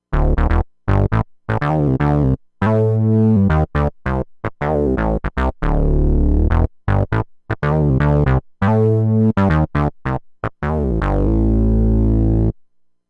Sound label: Bass; PLAN B analog blog